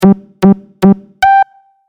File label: Race Countdown Beeps Fast; Beep Sound Effects